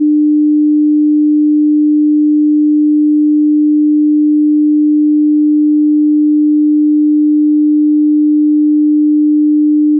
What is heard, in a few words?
sine tone 300hz; Tones